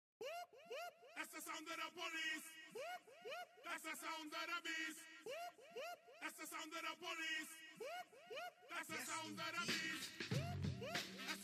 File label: Sound of Loop